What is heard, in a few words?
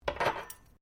cutlery general place bench, Household cutlery; Kitchen